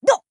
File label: death violent, grunt involuntary